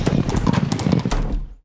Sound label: complete stable